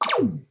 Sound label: laser